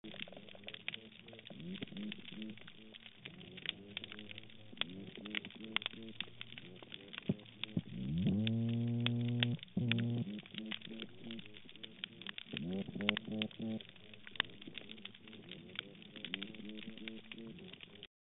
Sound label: Bocon toadfish chorus